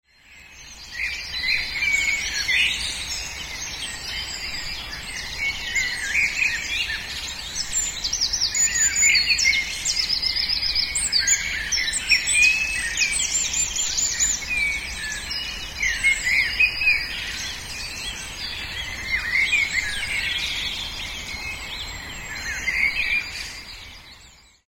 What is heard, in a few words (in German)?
Voegel